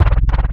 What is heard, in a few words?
Metals Kick; Sonic Foundry (Sony Creative Software); Esoterik Beatz; Metals Kit